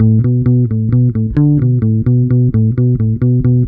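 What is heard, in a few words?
PIN BASSE, 130 bpm; USB Soundscan vol.32; Roots Disco; AKAI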